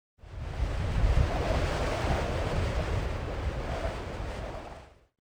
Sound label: high wind gust; ambience; Soldier Of Fortune II Double Helix; XBOX; kamchatka